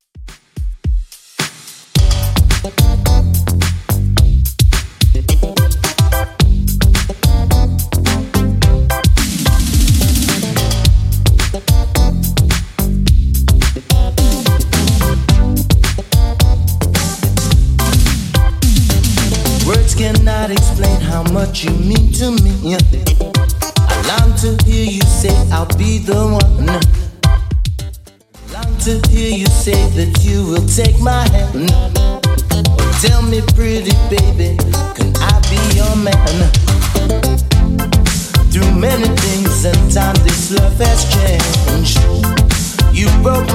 80s Redrum 108bpm CLEAN

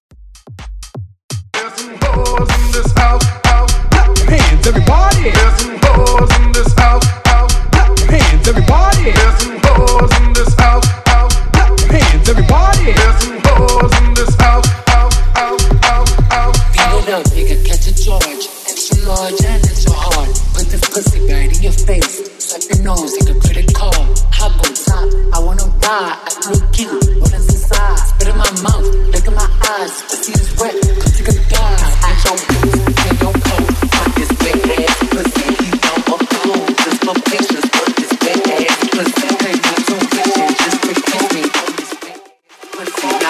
Tech House

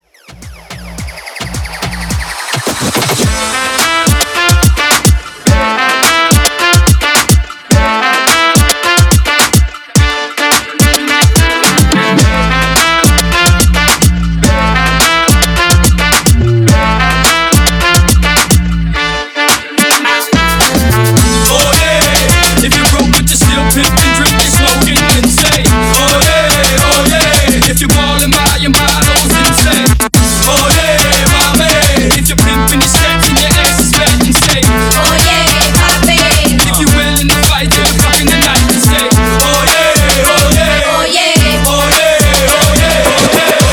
Bootleg Rework 107 BPM

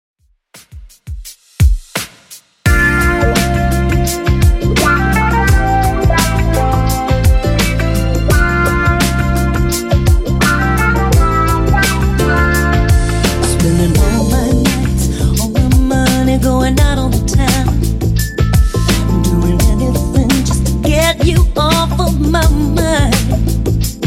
90s Redrum 85bpm

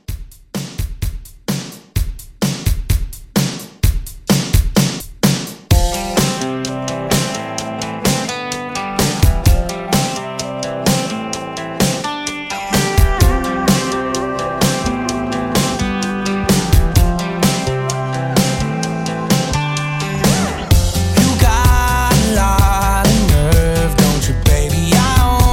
Mixshow Re Drum

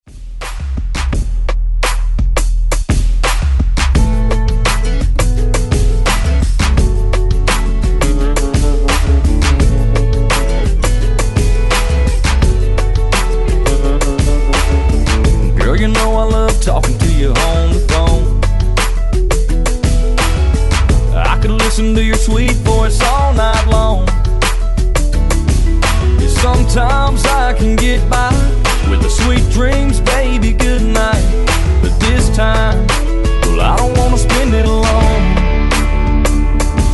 Re Drum Country 85 Bpm

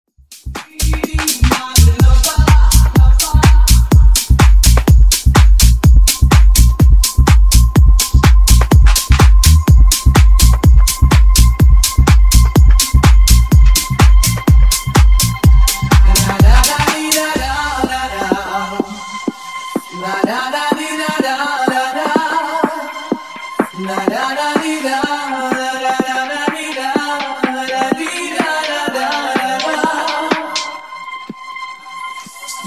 Funk 125 Bpm

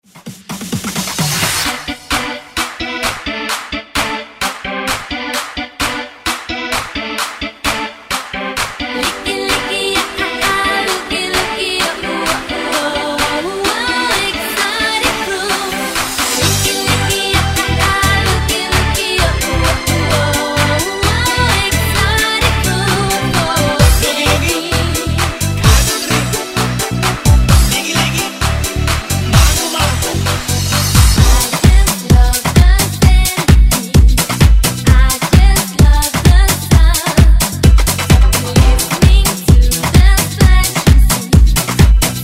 90s Afrobeat Redrum

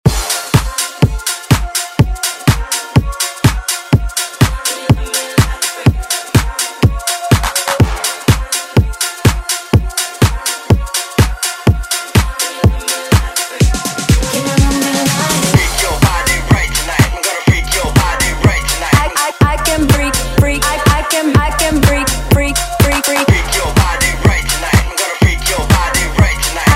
House Redrum

